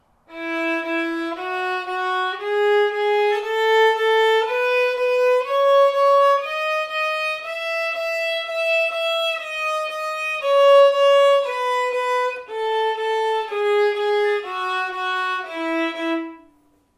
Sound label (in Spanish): mi ritmos; mi primer libro de escalas